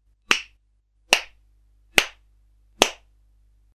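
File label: slap sound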